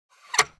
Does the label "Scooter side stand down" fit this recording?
Yes